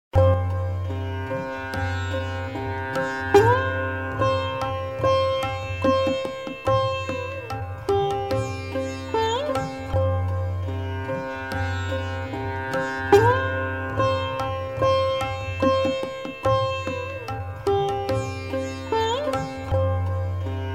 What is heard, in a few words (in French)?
Lecture de rythmes préparée; formation musicale fin de 2nd cycle 2017; examens 2017